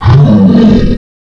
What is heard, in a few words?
roar